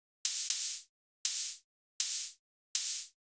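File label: Hat Open Dirty; hydrogen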